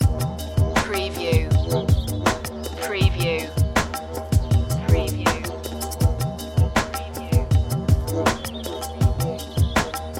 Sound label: fertile land loop